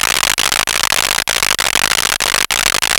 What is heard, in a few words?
ELECTRO, Electro Perc; E-MU Formula 4000 Series Vol. 3; Analog Odyssey